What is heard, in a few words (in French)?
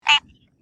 grenouille